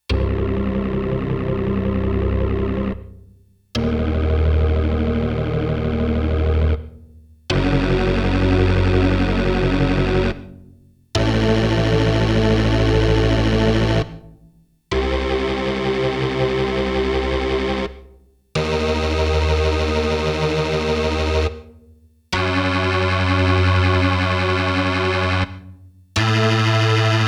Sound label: Roland JX-1 ROCK ORGAN B - with reverb and chorus